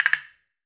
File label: CASTA AMB, CASTANETS; AKAI S-Series CD-ROM Sound Library VOL-5